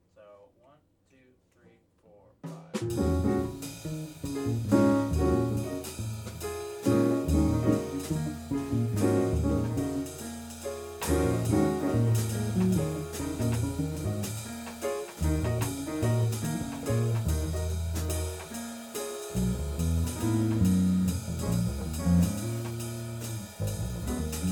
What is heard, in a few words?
jazz